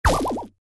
Spider Die

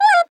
toy honk new; Five Nights at Treasure Island 2020 Remake

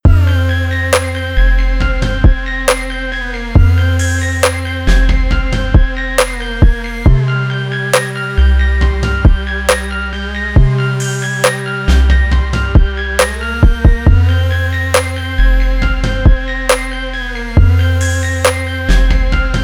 miniboss 137 bpm 4-4 signature; wildcat gun machine ps4 switch windows xbox one gamerip 2022

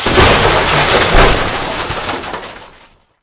Train Crash Impact; American Fugitive

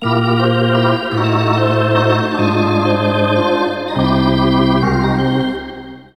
Organ; NHL FaceOff 98; PS1

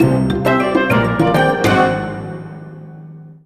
Jingle Lose; Inazuma Eleven Strikers 2012 Xtreme; Wii

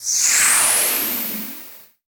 Sfx Ui Objective success; underworld ascendant

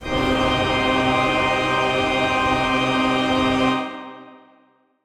Retry Jingle; friday night funkin vs. retrospecter part 1 original soundtrack

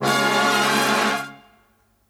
EXPLOSION

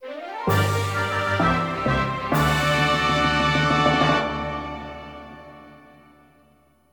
Boss Battle Fanfare; rogue galaxy; ps2

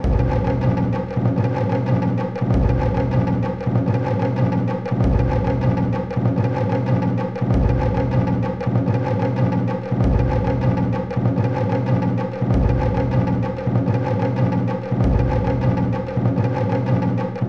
Hard kodo; raji an ancient epic

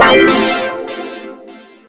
success; Taco Tom 2; macOS PS4 PS5 Switch Windows Xbox One Xbox Series XS

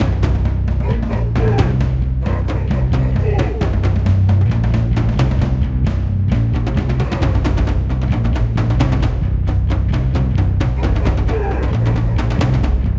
Caveman Paleo (Win); Cooking Dash

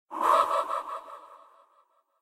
mascot new laugh

